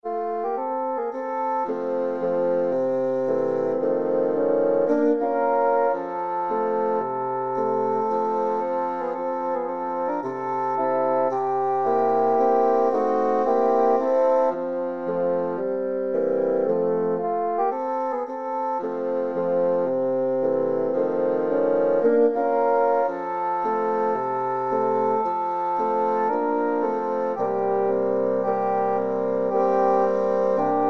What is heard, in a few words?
bassoon quartet